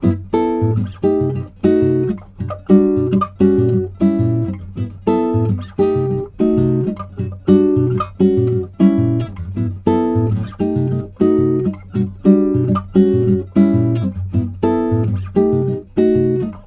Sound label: Bossa Dry